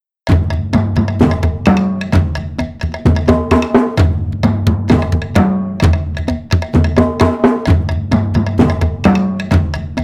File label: Island Ceremony Percussion Only 130